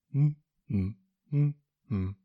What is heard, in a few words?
Humhum